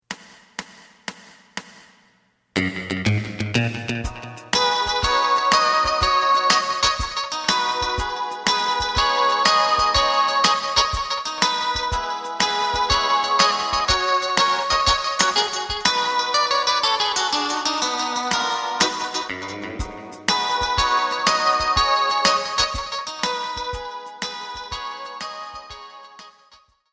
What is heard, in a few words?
bass